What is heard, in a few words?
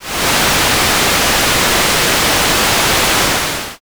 rain